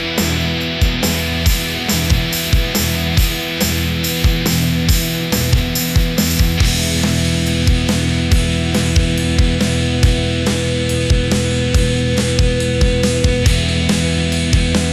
energetic rock

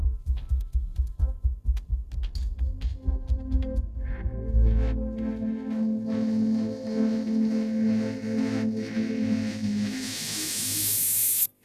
electronic dance music, ringtones; ai

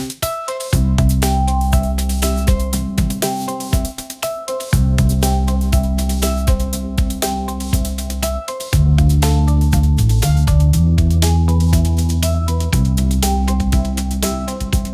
upbeat pop melody; ai

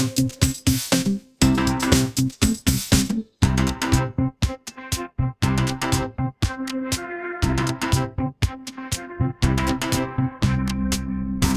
upbeat pop melody, ringtones

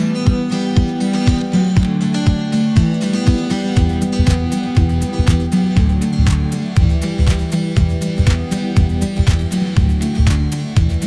sunshine pop groove

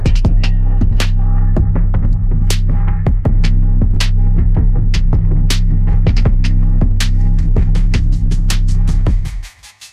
aggressive drift phonk beat

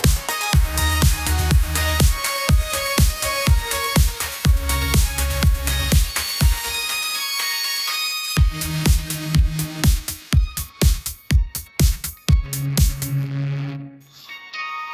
upbeat pop melody; ai

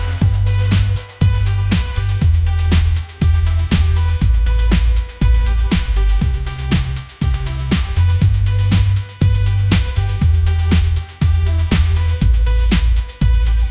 upbeat pop melody; ai

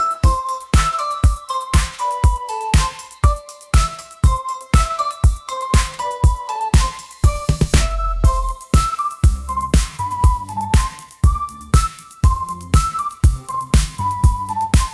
upbeat pop melody, ringtones

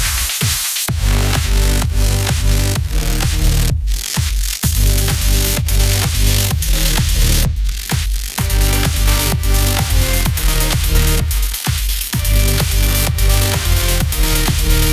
electronic dance music; ai

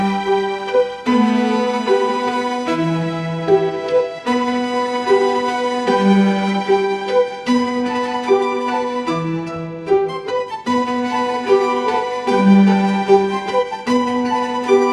orchestral strings; ai

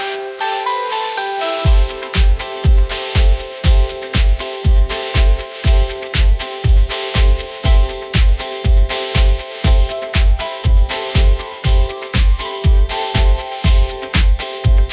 upbeat pop melody; ai-generated

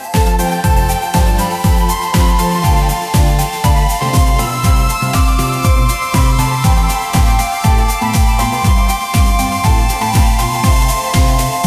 pop groove, ringtones

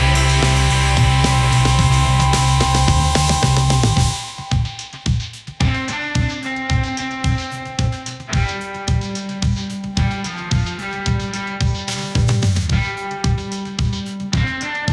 grit and swagger rock groove, ringtones

